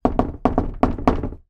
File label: household door knocks pattern rhythmic; zapsplat